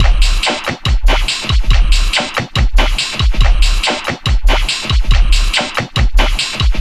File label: Beat, Drums, Eclectic Electronica